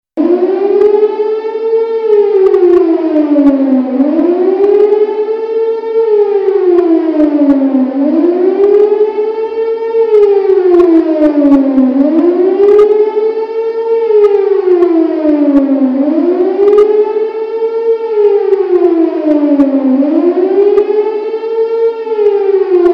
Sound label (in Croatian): sirena; ratovi